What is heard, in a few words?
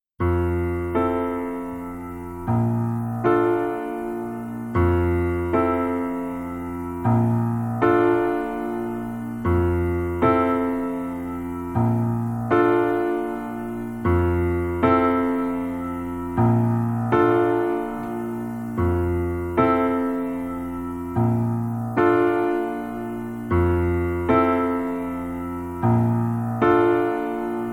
Piano Piano